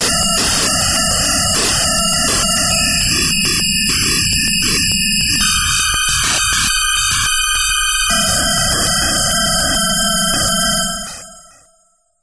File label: fx granular, yamaha tx81z